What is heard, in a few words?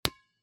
hit